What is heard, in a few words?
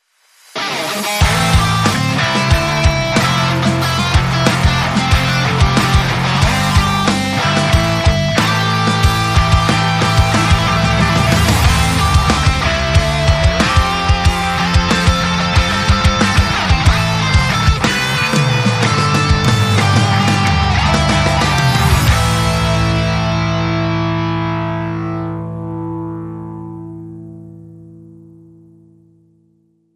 Hard Rock Strut